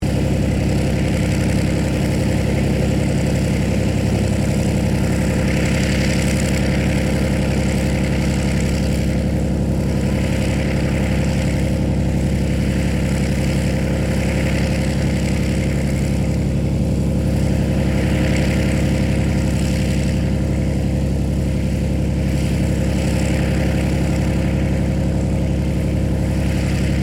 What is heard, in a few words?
Aircraft P-40 Kittyhawk idle loop, WW2 Kittyhawk or Warhawk fighter plane; 1940s; Airplanes